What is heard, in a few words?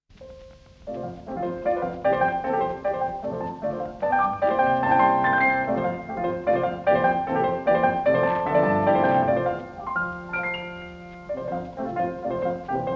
Etude Op25; Chopin